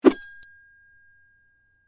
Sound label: Bright whoosh and ding